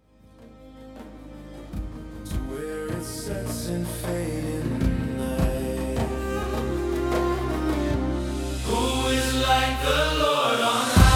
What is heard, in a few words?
Arabic Style